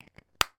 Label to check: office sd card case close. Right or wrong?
Right